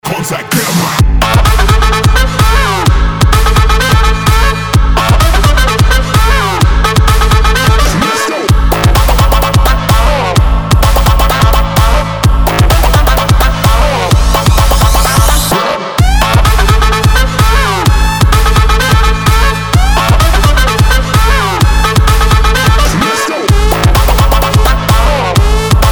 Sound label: sax